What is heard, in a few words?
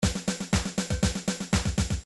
midi drums dance